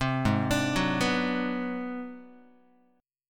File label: Ab 7th Sharp 9th Flat 5th Guitar, chord; Ab7#9b5 arpeggiated; JGuitar